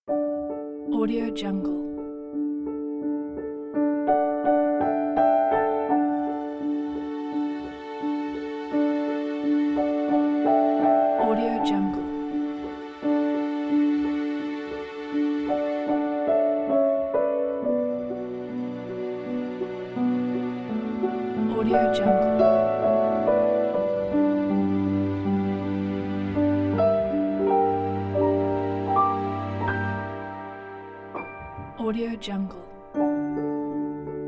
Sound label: lullaby